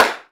piece, shape matcher

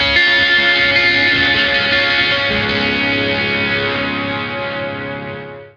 Lake Hi Gtr B flat; torchlight iii; ps4

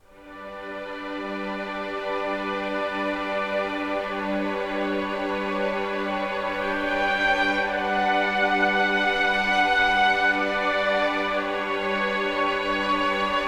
Loop (Strings Stem); Grandpa's Note - Loop 5; PS4 PS5 Switch Windows Xbox One Xbox Series XS

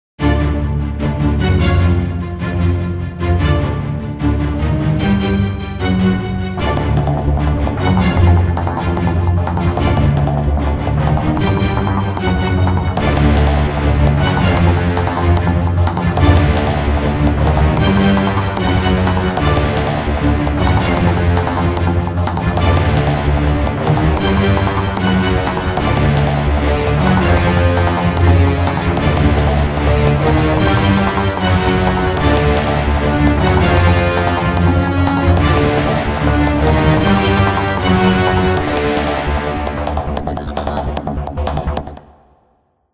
Epic Battle No Drums; Dual Blade: Battle of the Female Ninja

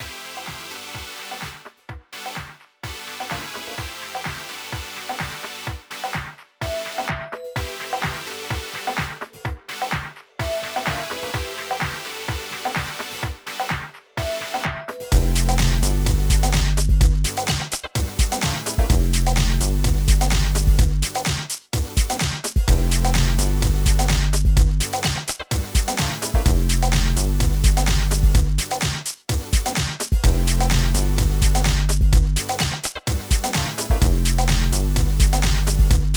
Minecart No Vocals; Lumo

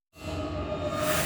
BGM Boss Intro; Metal Mind

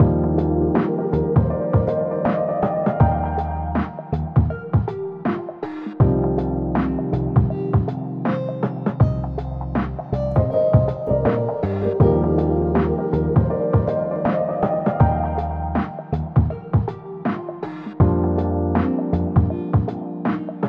Gate

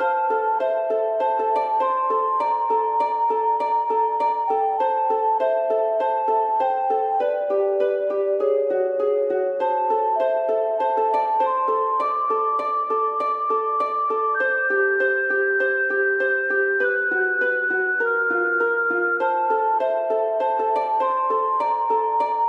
Harp sinus; Toki Tori 2

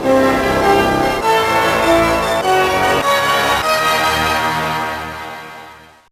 Hero Unlocked!; Justice League Heroes The Flash; GBA

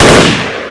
Rifle; MilkChoco

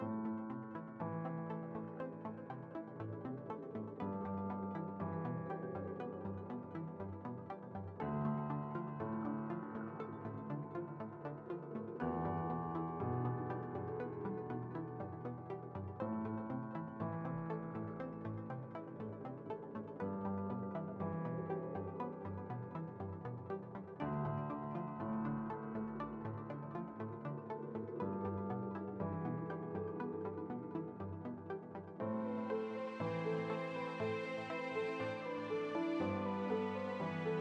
Piano Strings Ambience; Landinar Into the Void

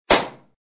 Burst; F.A.M.P.S

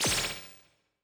Meter Stop

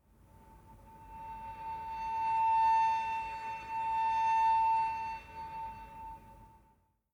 Savannah Music

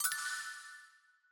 jingle combo start; Seven Seas Solitaire

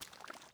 Foot Wet Puddle Walk

